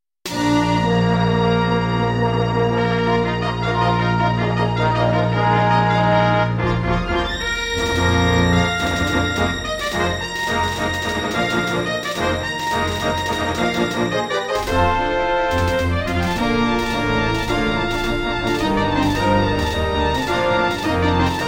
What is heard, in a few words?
Intro, Roland SD-90 GM2 MODE; Dark Forces